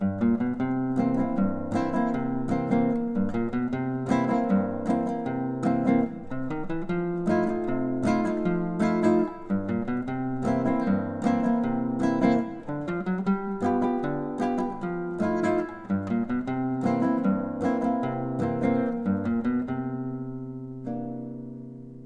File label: Lazy Blues